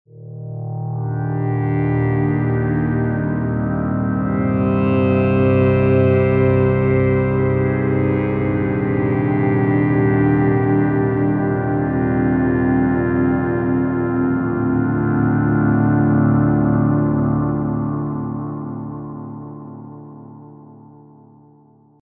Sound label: PAD